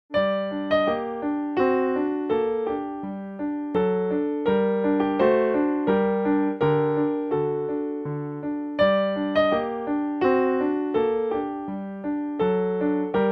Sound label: Toccata; Andante; Joao de Sousa Carvalho